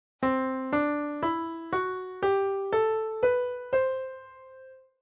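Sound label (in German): Tonleiter C Lydisch; Weitere Skalen; Tonarten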